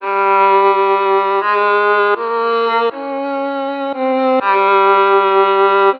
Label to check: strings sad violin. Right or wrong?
Right